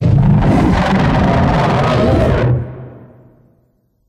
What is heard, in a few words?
Glyphid Oppressor Scream